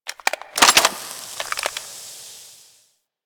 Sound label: Reload, Weapons; Supra